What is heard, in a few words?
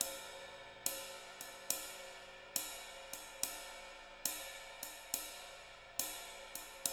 JZ70 SWING, Living Drums